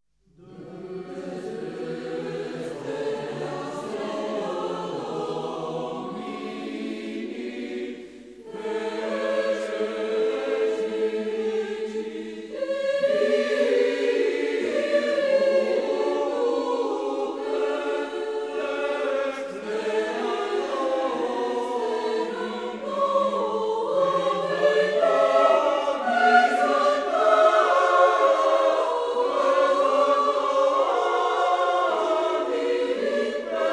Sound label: Momenti of Gregorian polyphony for chorus N.2 Dextera Domini Moderato